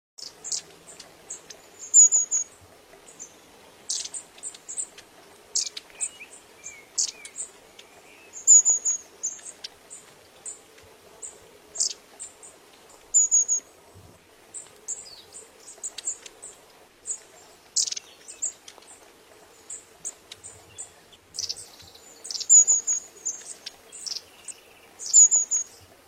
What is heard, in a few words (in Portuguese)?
Canto do rabilongo